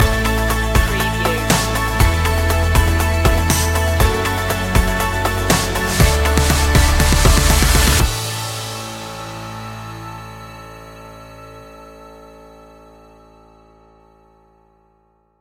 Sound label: sunshine stinger